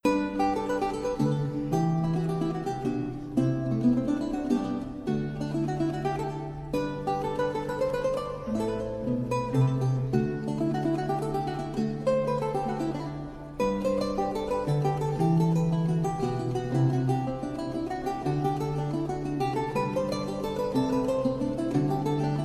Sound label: renaissance lute; john dowland